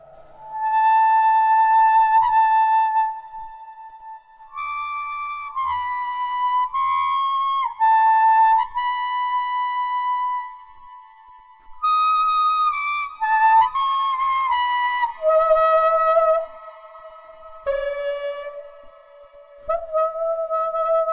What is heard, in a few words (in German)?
Rohr Reed Langsam